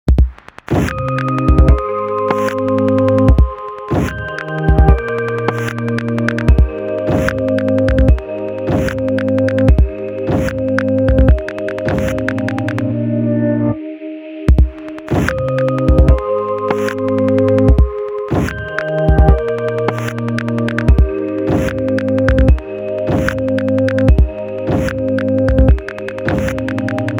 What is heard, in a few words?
Heartbeats; Motorola Droid Turbo; ringtones